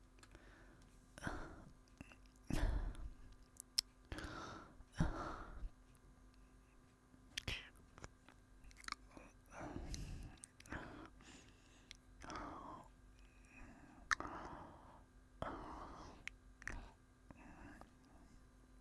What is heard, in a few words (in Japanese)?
ハァハァ（両耳）, ASMR